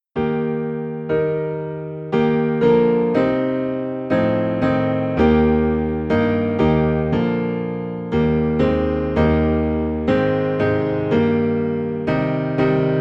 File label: Piano Low in E Flat; How Firm a Foundation